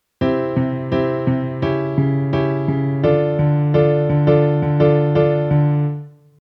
passing diminish

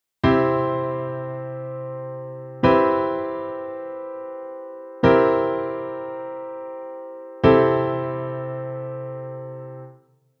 cliche c down piano

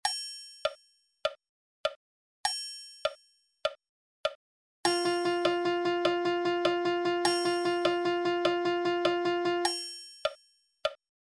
rythm

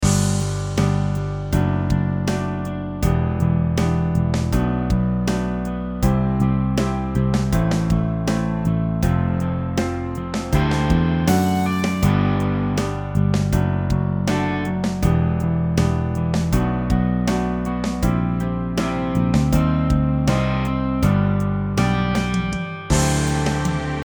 bass miss

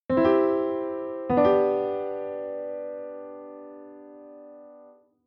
chord progress c g

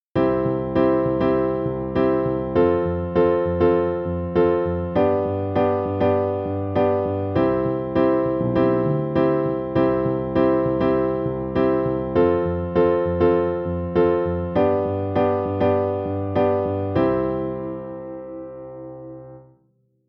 chord progress kadenz

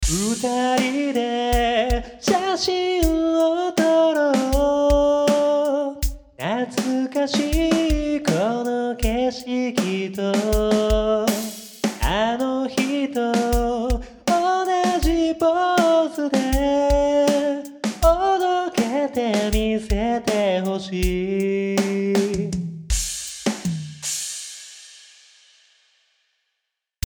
drum vocal

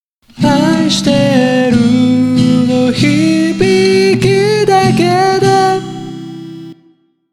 sing

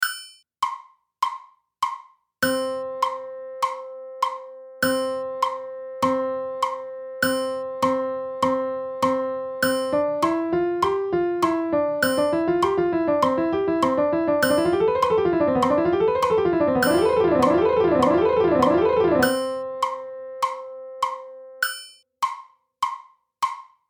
piano

